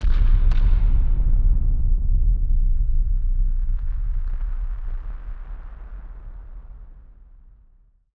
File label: Firework FX; musicradar; bomb factory